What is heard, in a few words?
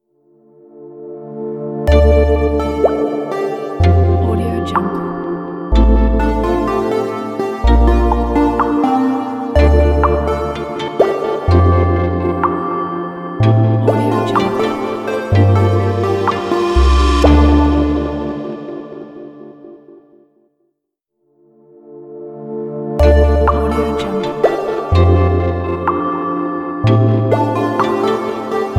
water drop ambient set logo